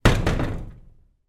industrial ladder step drop against wall in garage